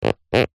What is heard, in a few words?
industrial duct tape rub finger squeak